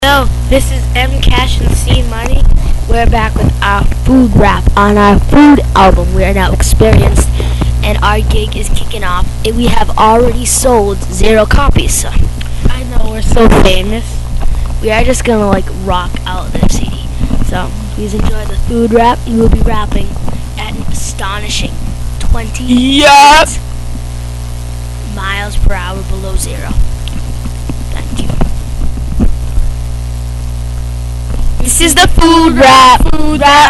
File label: food rap